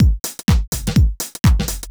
HOUSE BEATS 125

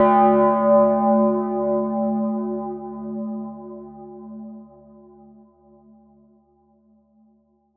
Atmos FX, Atmosphere & FX; Touch Loops; BlissedOutBeats

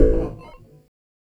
Industrial 140

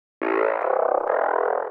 TEKNO 140, Techno; Techno2; Sphere

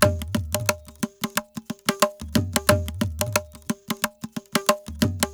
ETHNO PERCUSSION 90; ethno2

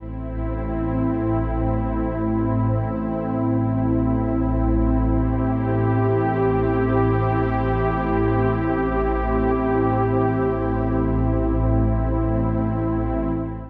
techno pad 140; Techno2; Keys

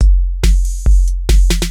DRUMLOOP TEKNO 140; Techno2

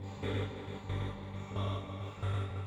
SYNTH PAD, INDUSTRIAL; Sphere

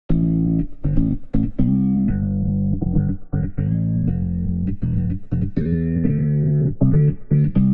Warped Bass, 120 D; Loops; Groove That; Touch Loops; CosmicFunk

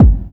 HOUSE BD

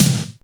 snare drum gated; SNAREDRUMS

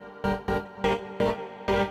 SYNTH INDST 125 A, Industrial, Keys

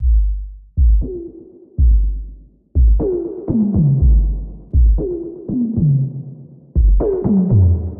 120 Beats, Drum Loops; Blissed Beats; Touch Loops; BlissedOutBeats

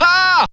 HAAAH; VOICES

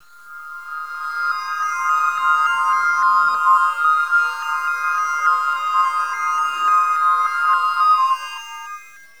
REVERS BELLS; KillerTweaksII; DirectWave

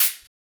Perc; OrganicTopLoopsMini; Touch Loops

